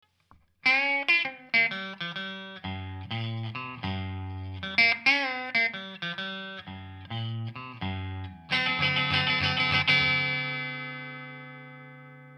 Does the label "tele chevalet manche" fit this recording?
Yes